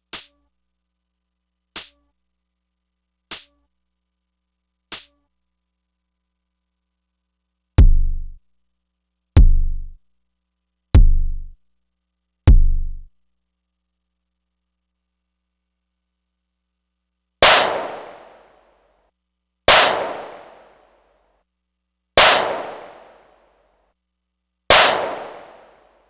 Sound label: DRM1 via MPC3K Hat Kick Snare one shots